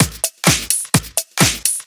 Straight Loop 128 BPM, House

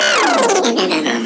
glitch vocal damage

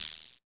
CR78 guiro; E-MU Formula 4000 Series Vol. 2; OrbitPercMaster

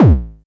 909-ish Kicks A1, Tuned Kicks; LIMAT Div Dept; Drums

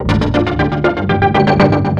ORCHFX, orchestra, Asian Traditions

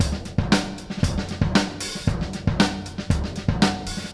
Beat Loops, 116; Zero-G - Monster Beats

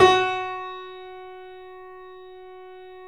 F#, 3-D Audio Collection, 3D Pianos, Yamaha C7; E-MU Producer Series Vol. 5

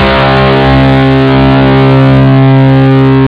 metal cho; jazz jackrabbit 1; M8

